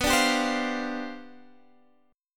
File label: G 11th B